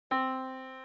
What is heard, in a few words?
yamaha Disk